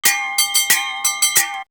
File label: Percussion; AMG - Coldcut's Kleptomania VOL-1